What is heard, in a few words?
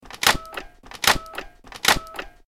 Triple Snap